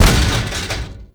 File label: Platform Debris; Props; Warframe; Tau; Circles Of Hell